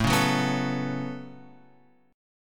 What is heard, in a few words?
A 6th Add 9th, Guitar, Open F; A6add9 strummed; x,4,1,1,2,4